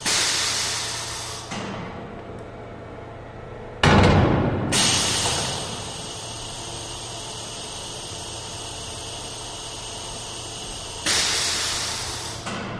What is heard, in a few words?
Space Sim Door, pneumatic